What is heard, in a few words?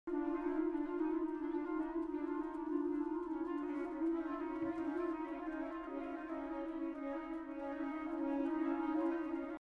Clocks And Clouds; Ligeti